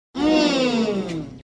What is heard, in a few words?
MMM; Comedy sound effect